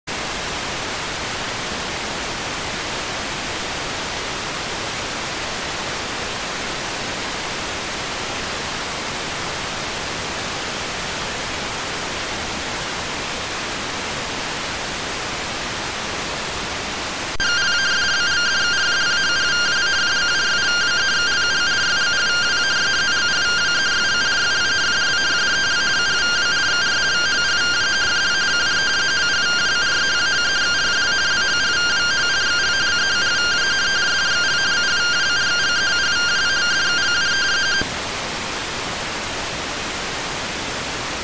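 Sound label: radioteletype receive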